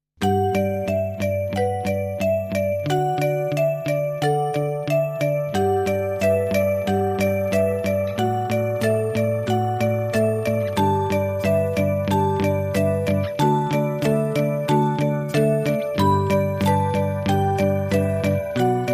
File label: ringing